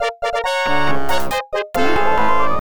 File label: Imperial Fighter Destroyed